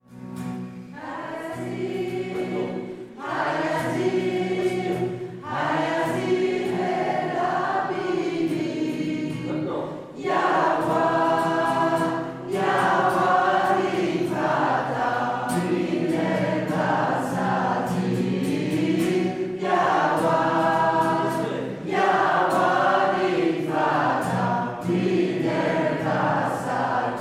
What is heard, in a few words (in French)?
tutti unisson chant